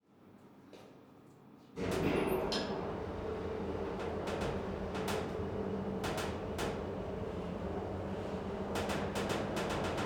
Room tone cargo elevator up down, Industrial hall; Ivo Vicic

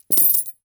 Coins Drop Carpet; Shapeforms; The Mint – Coins and Money

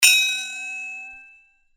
Sword Sound; FXHome; Fighting Sounds

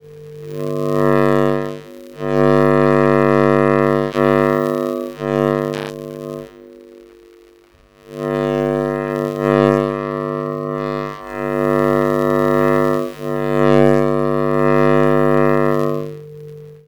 Electro Magnetic Fields - Buzz Tonal; Systematic-Sound; Sound Themes – Electro Magnetic 01

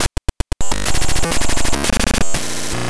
Rhythm; 99Sounds; Mangling Audio; Data Mosh Loops; More Rhytmic

